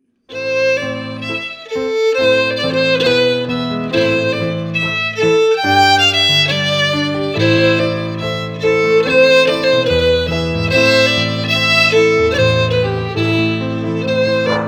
FIDDLE PIANO; Jink & Diddle Book o' Tunes 2022

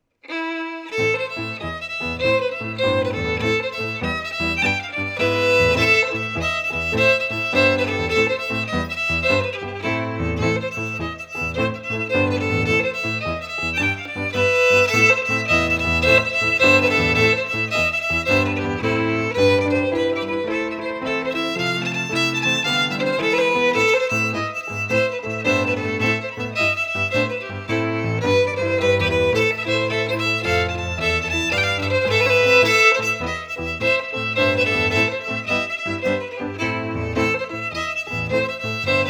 FIDDLE PIANO; Jink & Diddle Book o' Tunes 2022

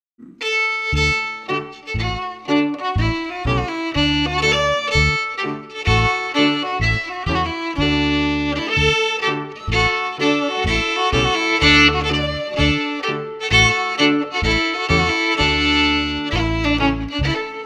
FIDDLE PIANO; Jink & Diddle Book o' Tunes 2022

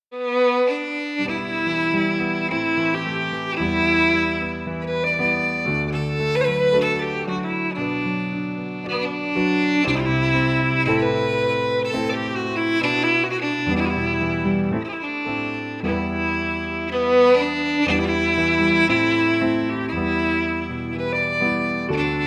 FIDDLE PIANO; Jink & Diddle Book o' Tunes 2022

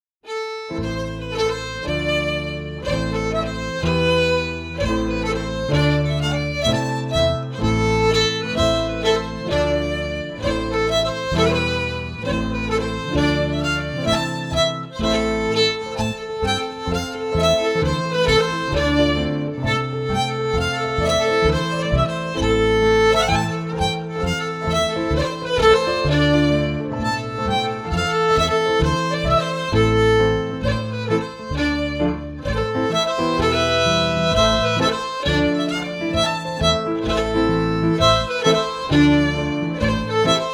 FIDDLE PIANO; Jink & Diddle Book o' Tunes 2022

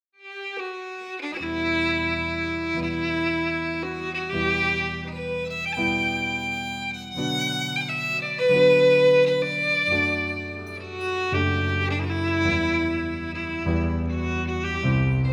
Lament, FIDDLE PIANO; Jink & Diddle Book o' Tunes 2022

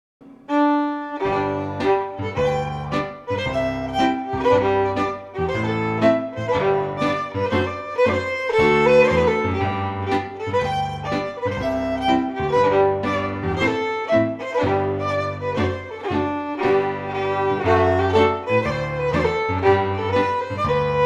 Scottish Fiddling; 2019 Book o Tunes

